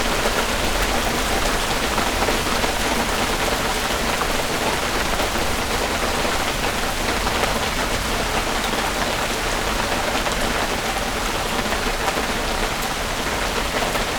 Rain

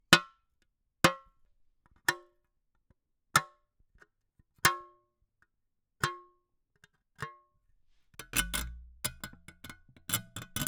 Beer Tin, Drinks Can

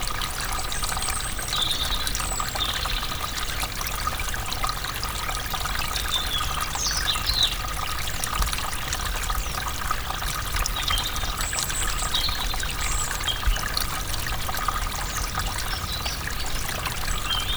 River, Static Microphone

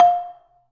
Gambang Hard Beater, Gamelan

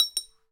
Marble On Base, Large Wine Glass

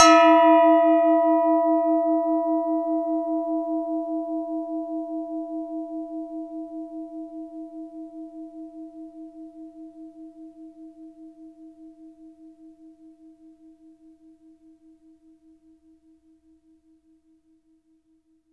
Clang, Kitchen

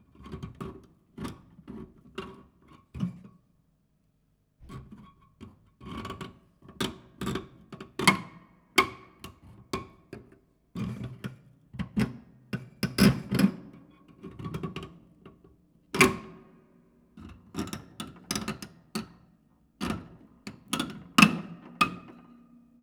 Plier Grind, Piano Destruction

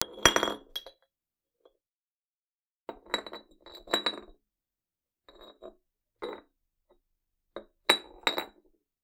Bottle Rattle And Roll; Bottles

